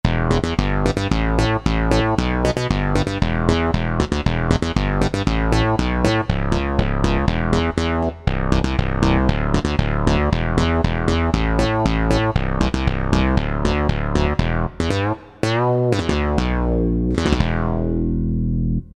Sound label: Synth Bass, Roland U-220; Factory Patches